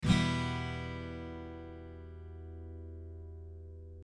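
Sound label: Em, guitar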